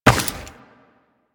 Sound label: Run Rock, Footsteps; DE; Warframe; Tenno